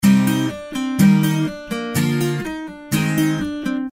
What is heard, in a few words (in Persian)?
ریتم گیتار آکوستیک